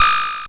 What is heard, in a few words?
blips, opl3